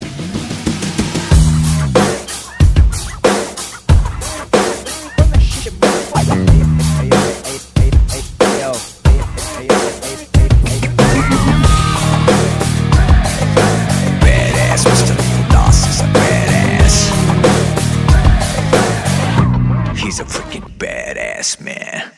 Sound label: Badass BGM Ringtone; Leo